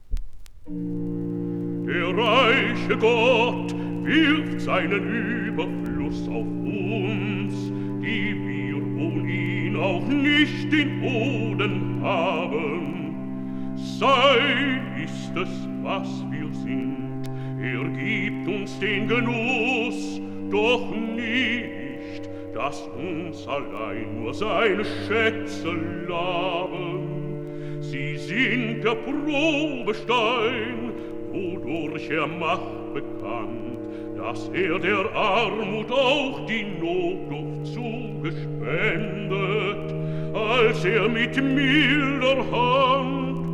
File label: Recitativo; Der reiche Gott